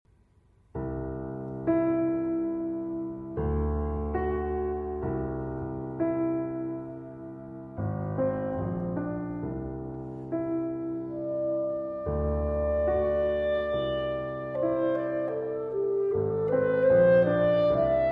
Clarinet Quartet & Piano, Lament

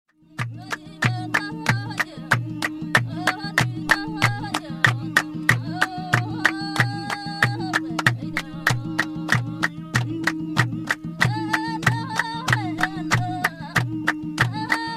Tuareg Women Tendé Drumming

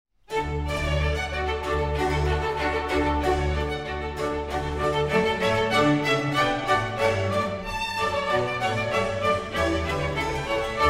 Harpsichord Concerto E major Allegro; BWV 1053

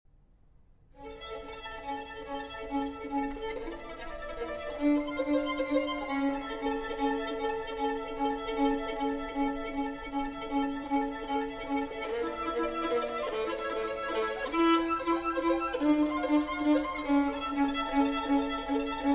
For Violin, String Orchestra and Percussion

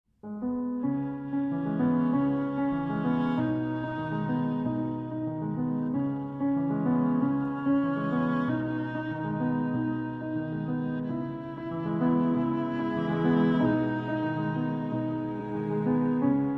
Piano Quintet

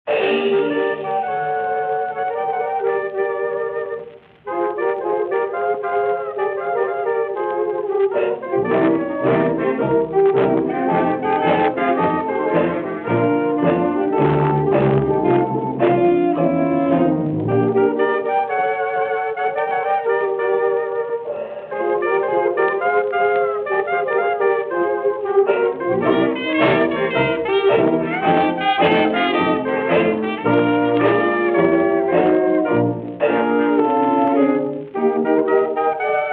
Harmony Blues

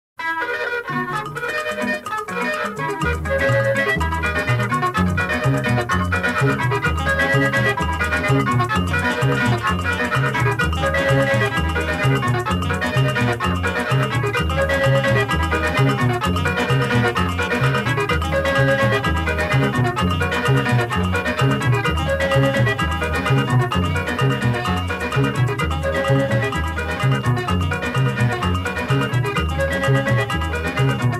African Jazz, Merengue

